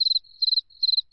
cricket

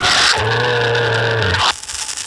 guard pain

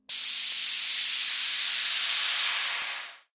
gas; ze space

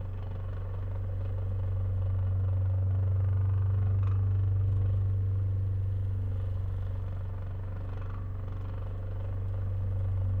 old fan

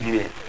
die

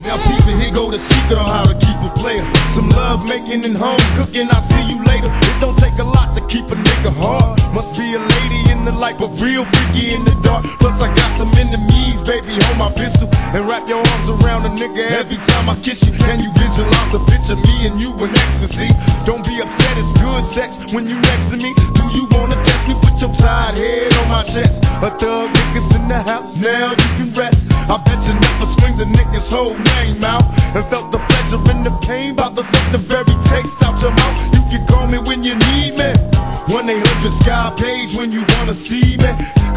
music amb

